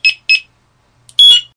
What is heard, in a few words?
Alarm